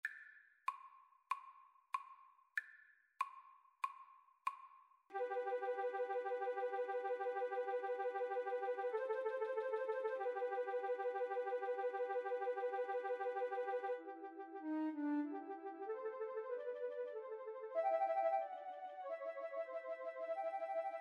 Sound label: Flute duet